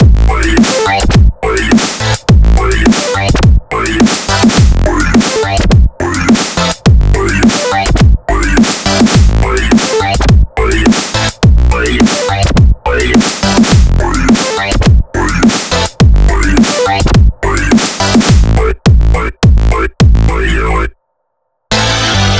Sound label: groove dub